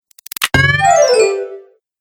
Cartoon Magic Run Up Leap, Bounce Sound Effects

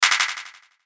button delay; Multimedia; Buttons

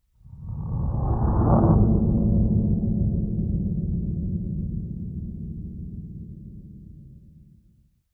Creepy Diffuse Horror Transition; Scary Soundscapes; Crafted Signals

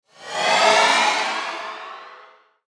Ascend Reverb Sweep; Blastwave

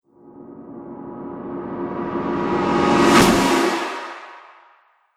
Trailer Smash Riser; sound design; sonic logo

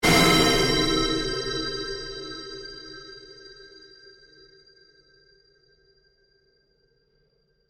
pulse, Various sweeps, pulses and drones; Rise And Dive Sound Effects; Sound Design

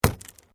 Metal Light Movement Minor

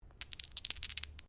Dice Medium Pair Shake Hands; Variety of dice sounds; Casinos

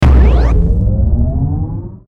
Hit Double, Hit Double Ascend; Ascending; Blastwave

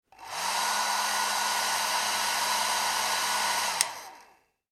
household hair dryer on operate off; Bathroom; Hair Dryer On , Operates Then Off; McKinneySound

